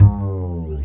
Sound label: BASS, 140 BPM, Drum & Bass; Ueberschall; Drum & Bass Flash Trackz